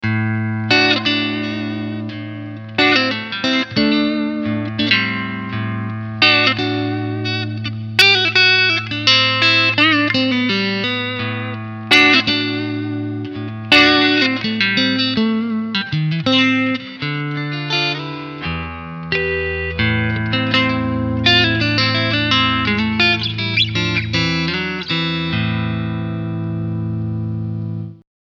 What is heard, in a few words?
SC Front Clean Lead